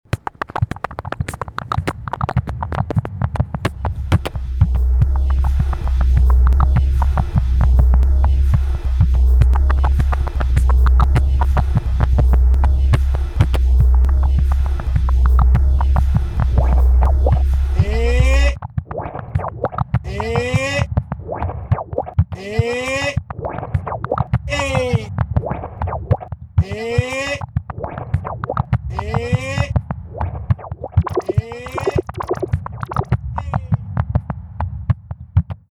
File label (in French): Musique Concrète